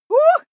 female shout surprised; schreie; menschen

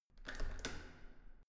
entrance door handle use hard

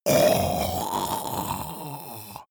monster choking zombie dying; koerpergeraeusche; comedy-cartoon; soundpool

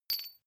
medium metal impact medium chink

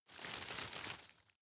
rustle medium; berufe; foerster

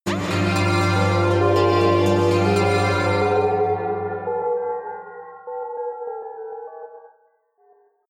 synth bells roll high, drones

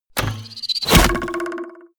arrow hit; krieg-waffen; pfeil-bogen; soundpool

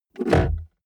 car bmw e46 interior center console open

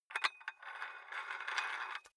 dumbbell close nut thread, fitnessstudio

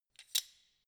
wrench open light; schrauben drehen hydraulik

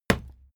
fight impact sweetener cloth; nahkampf; soundpool

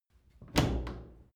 wood door old unlock

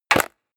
mechanical switch wood medium push; industrie-wirtschaft; mechanik

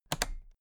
toolbox latches close regular double; industrie wirtschaft; handwerk

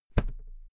briefcase close damped; industrie wirtschaft; bueroarbeit

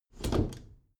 bathroom cabinet hinge scratch; objekte; tueren

